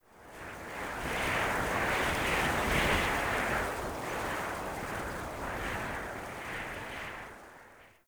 Mountain Wind Gust Whistle Soft

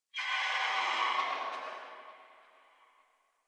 Tree Distant Critters Angry, Ambience; Warframe; Duviri

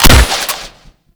Hunting Bow Prime Fire 3D B; Warframe; Weapons

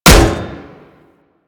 Staff Impact Metal

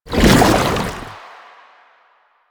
Chem Strike Goo Layer, Footsteps; Enemies; Soldiers; Foley; Warframe; Lasrian; Nox

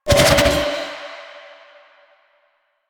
Scythe Charge Spin; Weapons; Stalker; Warframe

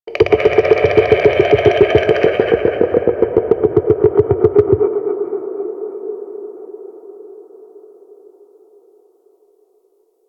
Infested Golem Vocal Idle; Warframe

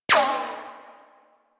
Weapon Hit Effect Metal

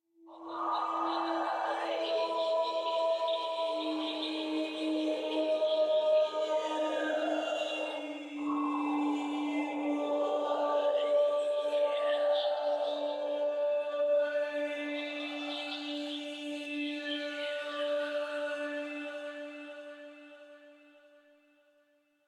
Choir; Warframe; DE; Sentients

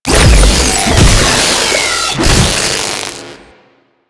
Proto Infested Ancient Tentacle Swing Flesh; Enemies; Abilities; Warframe

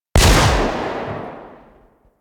Revolver Rifle Shot; Warframe; Tenno

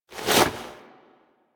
Foley Misc; Warframe; Operator

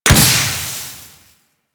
Pistol Projectile Explode; Weapons; Warframe; Tenno; Guandao

